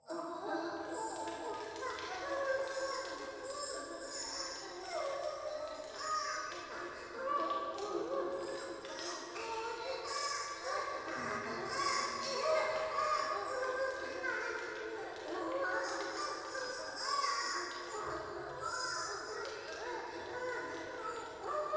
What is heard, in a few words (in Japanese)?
風呂 低音喘ぎ 暖炉, ASMR